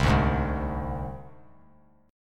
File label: C Suspended 2nd Sharp 5th, Csus2#5 chord; strummed; JGuitar